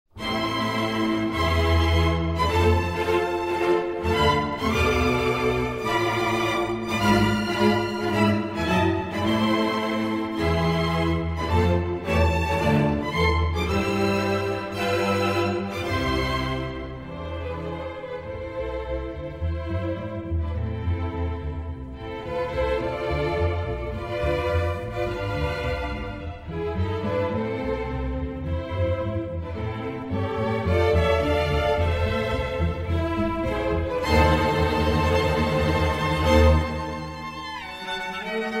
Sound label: Orchestra